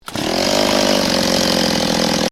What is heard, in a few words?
Chainsaw: petrol chainsaw engine start and into idle; Machinery; Machinery Sound Effects; Stuart Duffield